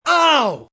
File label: Pain Aow, Pain, male; Voice Box